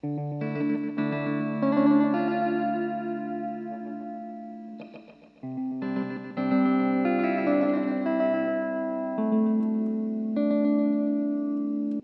delay

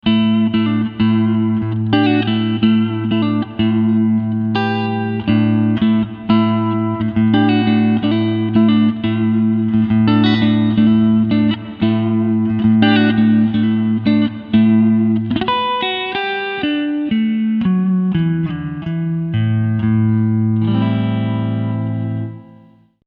Clean SM57 Reverb

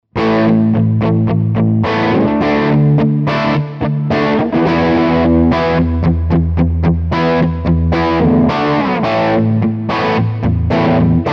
Framus Diablo Pro Bridge Humbucker crunch

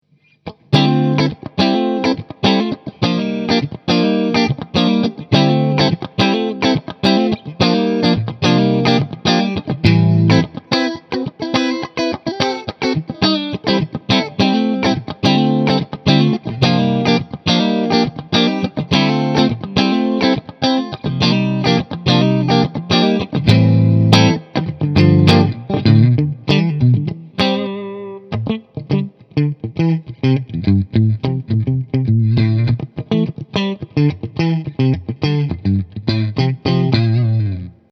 Strat Clean Funky

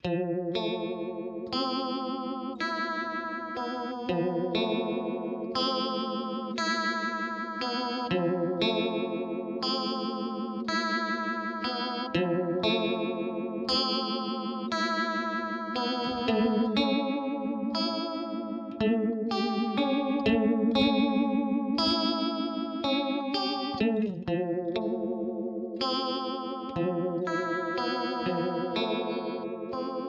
Zelzah 4 Stage Depth Rate; Strymon

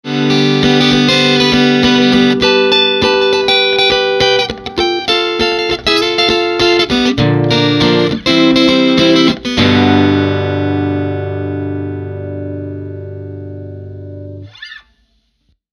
Walden T550 no Brilliance

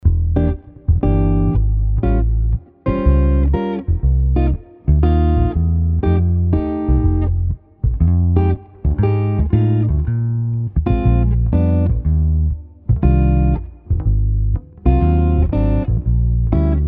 Jazz

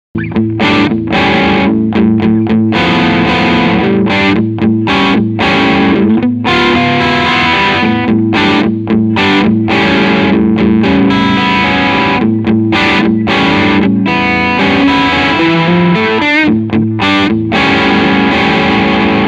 Medium Gain

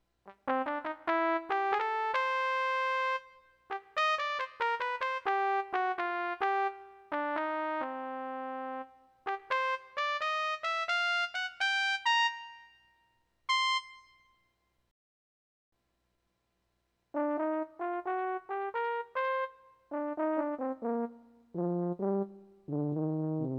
Brass; Prodipe

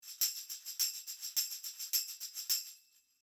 Beyer M88 Tambourin 1 Meter Behind 180

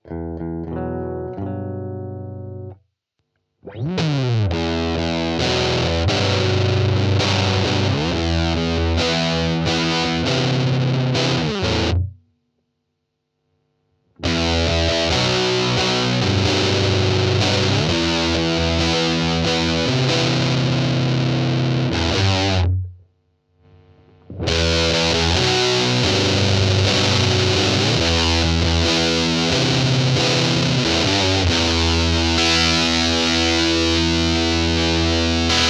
Indie Fuzz riff; VALETON Dapper

